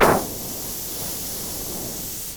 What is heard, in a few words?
gas; EPSILON-G